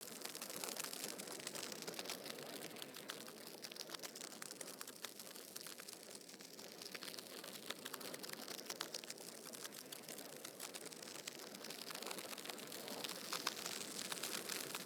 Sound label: Soul Mode Hand Flame Loop; Powers; Fenn